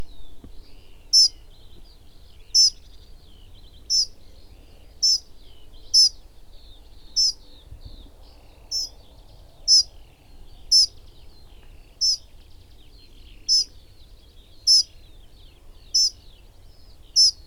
Dunnock Prunella modularis

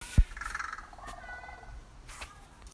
Garden Animal Sound Late Afternoon London

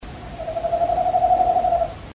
nightbird

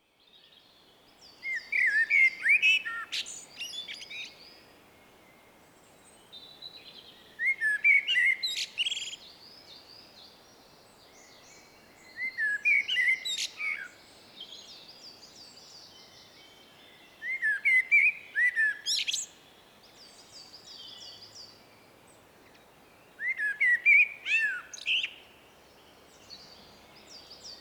Blackbird; Valentine's Day; RSPB South West

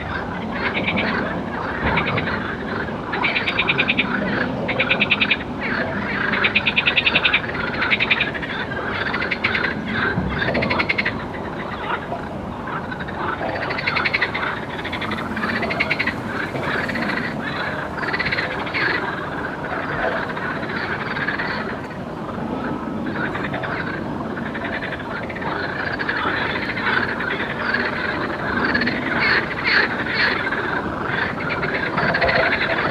southmere lake

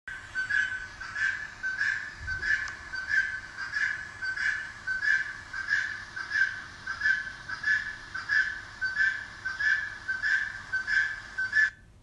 Noise

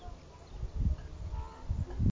Bird

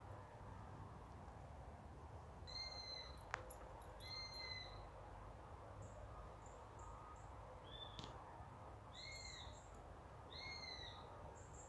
Bird call

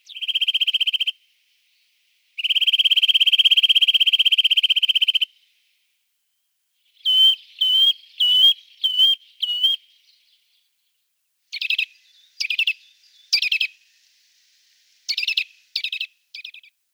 Nuthatch songs

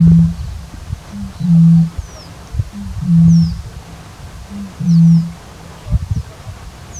Single bittern boom

